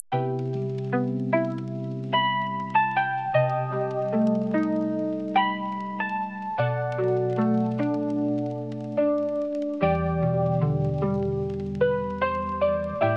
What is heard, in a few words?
music lofi